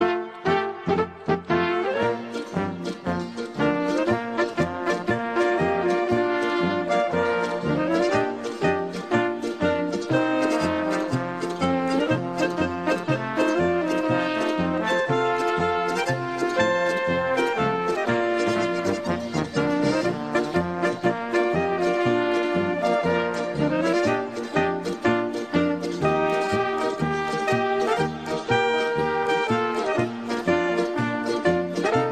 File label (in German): volksmusik